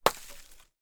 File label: Impact Ice Moderate